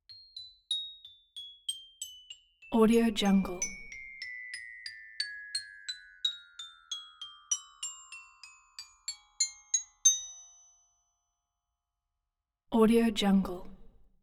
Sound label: int xylophone tip toe; collanderson